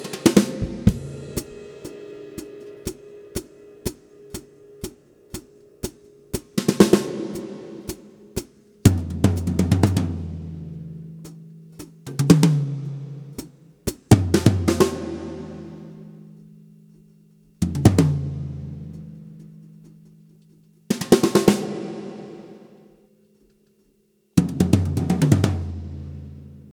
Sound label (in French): Fin batterie